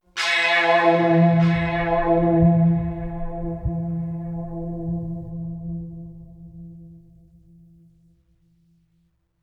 String Mystery; Inquisitor 3

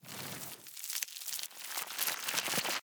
Earth Spell Ready; AudioWizards

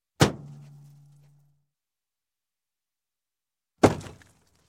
Metal, Bang Hammering Automotive Body Panel; Sound Ideas 2000 Series

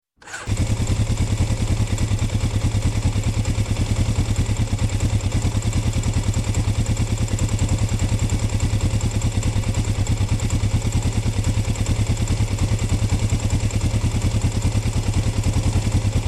Motorcycle, 400cc Start, Idle, Stop; Sound Ideas 2000 Series

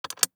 DICE on Glass, Drop, Standard, 5 Five Dice; Samples; ArticulatedSounds; DICE Sfx Party (Extended)

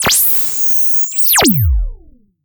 Scanner Shut Down; Plastic Surgery

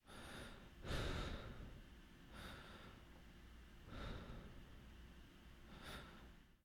breathing; blindside

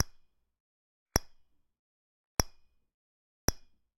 Generic Button Glass Small; boom library; The Interface

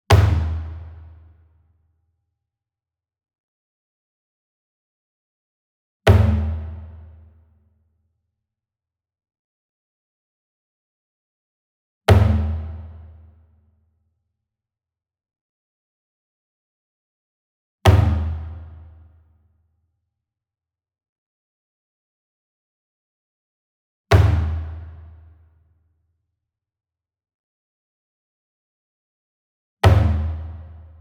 Single Drum Kodo Hit Hard Beater Medium; boom library; Cinematic Strikes Construction Kit

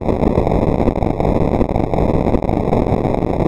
Static; Blastwave

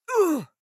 town human road hit; Blind Drive

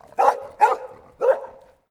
dog distant; DeadFright

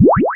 reverse; Quentin C's Gameroom

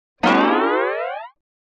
Slide Guitar Comic Stretching; Hollywood Edge; sound designer's toolkit v2

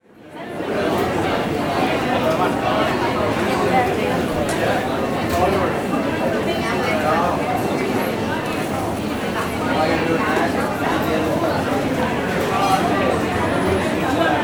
Restaurant Busy Fast Food Coffee Shop; Sound Ideas; Walla Series

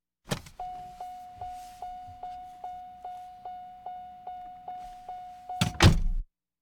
Automobiles various

